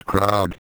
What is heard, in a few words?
CROWD